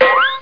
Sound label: Plop; How Many Bugs in a Box